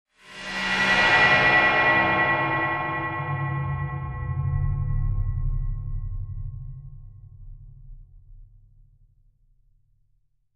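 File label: Wash Electronic Tone Feedback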